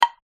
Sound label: notification